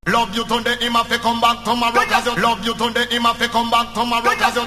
Vocal (Ragga)

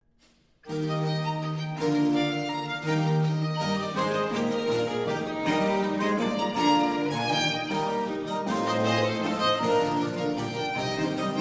Trio Sonatas, No. 4 in E Major, Allegro; Vivaldi; L'Estravagante

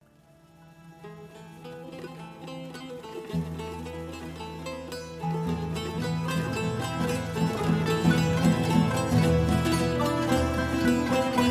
Danza morisca (Instrumental)

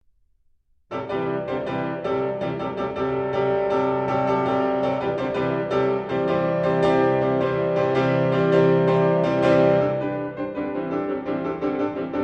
Chords Together and Opposed, Piano; Book 5; Mikrokosmos, Sz. 107; Bartók; Complete Solo Piano Works

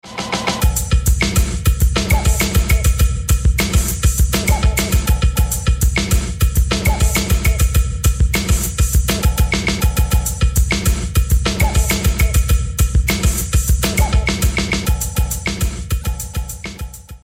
Beat

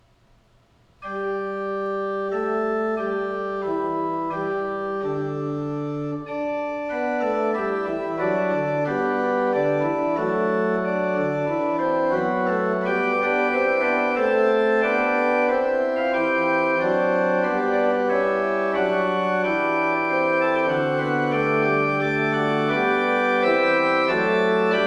Chorale Prelude, Organ; ''Komm heiliger Geist, Herre Gott''; Johann Pachelbel; Complete Organ Works

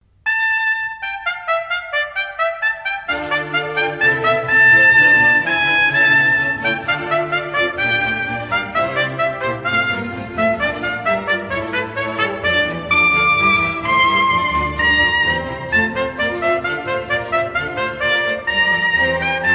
Trumpet Concerto in D Minor, Alla breve; HWV. 367a

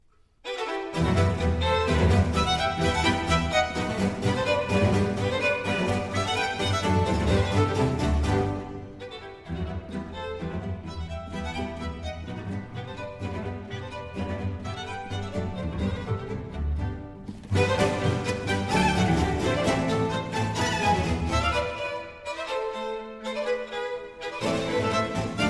Concerto for Strings in E Minor, Allegro; RV 134; Vivaldi; Concerto Italiano

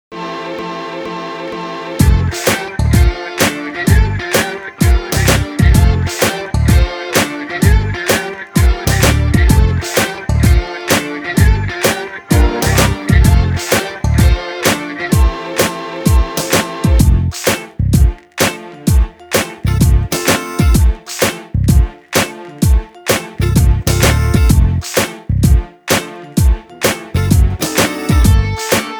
(Instrumental - Karaoke); Star Academy 4

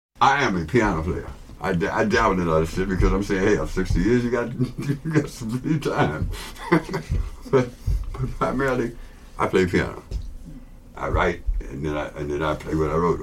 Piano Player (Intro)

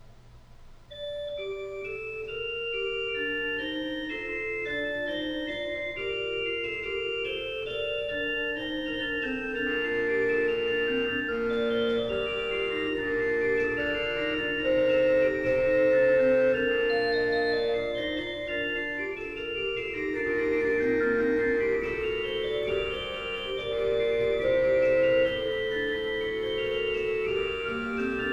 Chorale Prelude, Organ; ''Wo Gott der Herr nicht bei uns halt''; Johann Pachelbel; Complete Organ Works

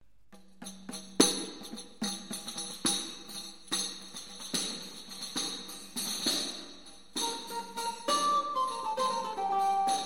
Tordion; Musique de Ioye; Tordion 2; Renaissance

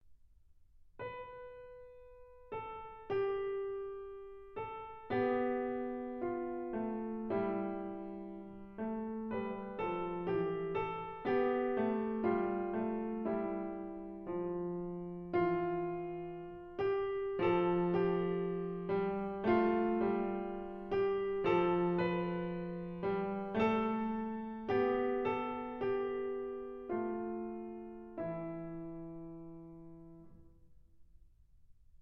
Canon at the Octave, Piano; Book 1; Mikrokosmos, Sz. 107; Bartók; Complete Solo Piano Works

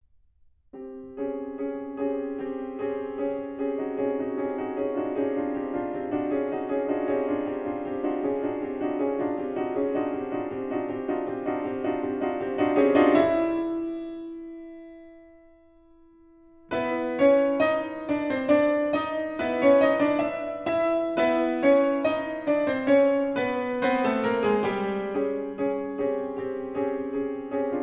Clashing Sounds, Piano; Mikrokosmos, Sz. 107; Bartók; Complete Solo Piano Works

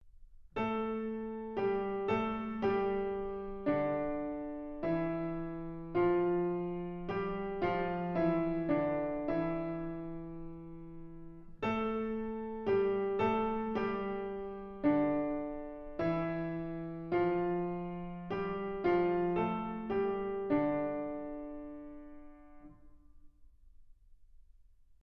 Unison Melody, Piano; Book 1; Mikrokosmos, Sz. 107; Bartók; Complete Solo Piano Works

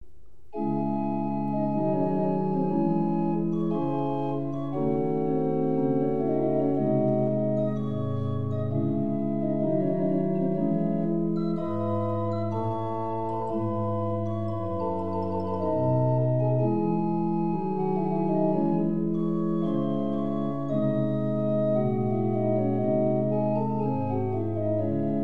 Organ Concerto in F major, Adagio; Handel; Organ Concerto No.4; Op.4 No.4 HWV292

